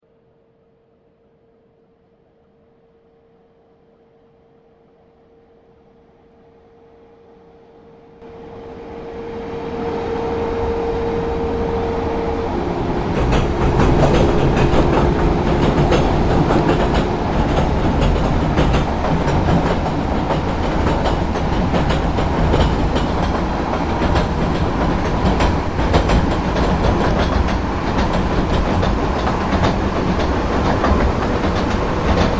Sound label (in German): ICE1 Weichensound; GR Add-Ons